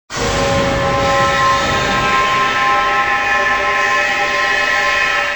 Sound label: demonic pipe